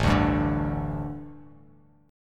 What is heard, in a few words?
A-7th Suspended 4th-A-5,5,5,7-1-down; A7sus4 strummed